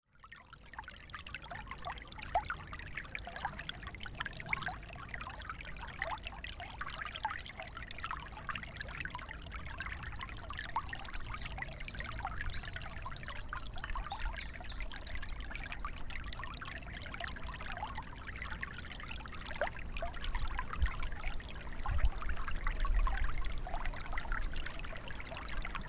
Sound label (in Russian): malenkiy ruchei; журчания ручья